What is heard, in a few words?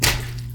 Splat